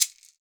SHKR LEGG, Synclavier Percussion; Synclavier Percussion 2; World & Orchestral; ILIO

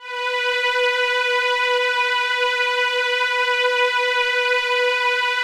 FASHION B4; Drums In Your FACE!

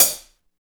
BRITE HAT; East West - Phat & Phunky

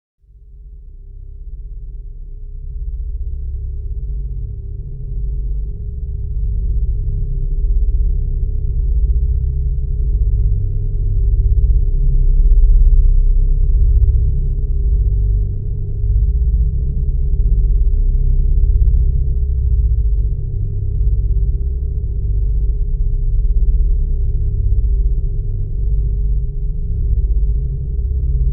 Subsonic Flanged Bass; Bizarre Planet

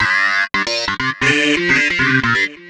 Funky Lick, Keyboards

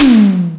TOM ELECT; USB Soundscan vol.27; Ragga & Dub Reggae; AKAI

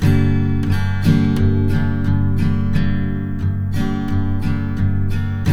TK MIN G#2, Guitars; Spectrasonics; Hans Zimmer Guitars VOL-1

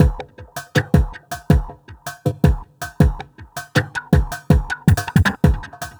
Drum Loop, Drum Loops

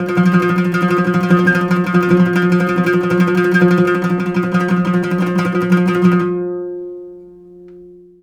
LUTH 2 F3, Arabian Traditions; USB Soundscan vol.43; AKAI

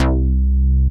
BIG BASS; AMG - Global Trance Mission VOL-2; SYNTH

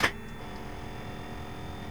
on off, Switch FX; Masterbits

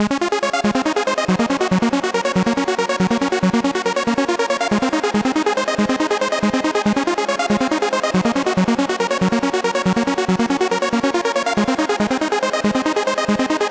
140BPM C#; Vengeance - Essential Clubsounds Vol.4

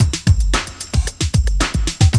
Loop, Roland; Sound & Vision - Gigapack I

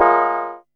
HI BELL; Back In Time Records; Big Bang; TDP+BELLS2

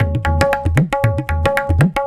Tabla

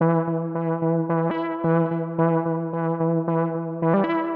Synth 110BPM

